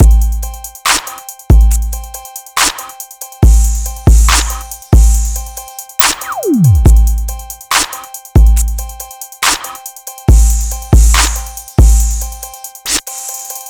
South HipHop Drums; Pro Tools Essential Loops; Digidesign

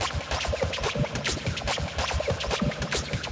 144 Techno Drums Fx; Dance DJ; Pro Tools Essential Loops; Digidesign

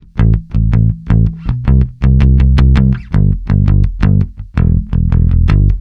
165 Pop E-Bass Amaj; Pop Rock; Pro Tools Essential Loops; Digidesign

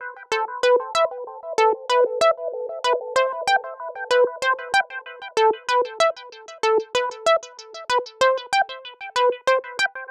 Latin HipHop Synth E min; Digidesign; Pro Tools Essential Loops

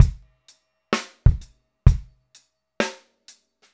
064 Pop Ballad Drums; Pop Rock; Slow Tempo; Digidesign; Pro Tools; Pro Tools Essential Loops

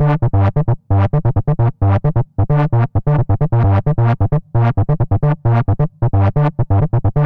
132 House Bass Arp Gmaj; Dance DJ; Digidesign; Pro Tools; Pro Tools Essential Loops

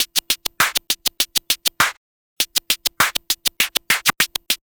Vinyl Scratch Clap

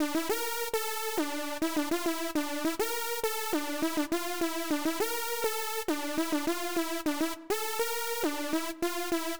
HipHop Trance Synth Emin; Digidesign; Pro Tools Essential Loops

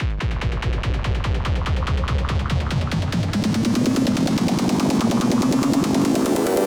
Techno Kick Rolls; 144; Dance DJ; Drums; Pro Tools Essential Loops; Digidesign

